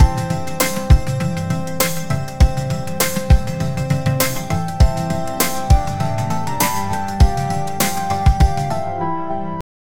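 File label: loopable background, slot; 10 second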